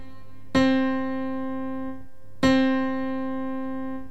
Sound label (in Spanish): sonidos largos